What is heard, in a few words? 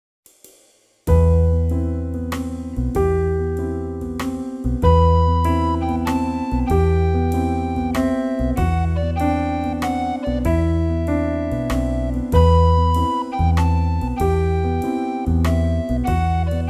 Swingtime Stroll, recorder; Razzamajazz Recorder